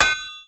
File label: punch locked